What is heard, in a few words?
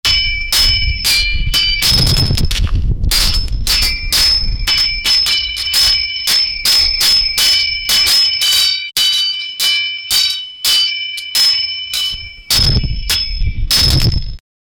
the cry of an army